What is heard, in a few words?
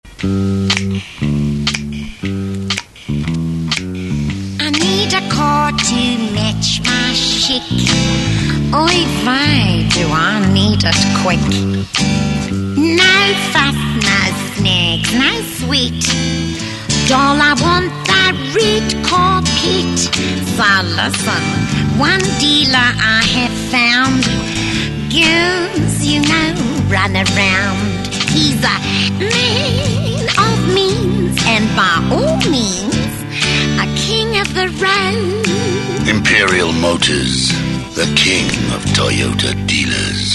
Jingle; E Skill Jingle3 Showcase